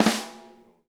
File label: FLAM, SNARE, Drum Kits; Sonic Reality; Interactive Drum Kits